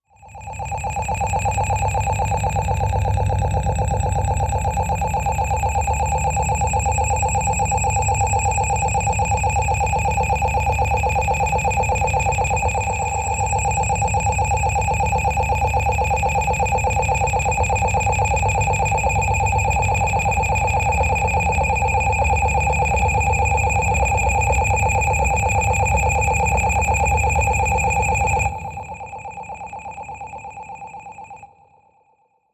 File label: Glass Engine Machine, machines winches